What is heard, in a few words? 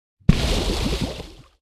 torpedo; World Of Warships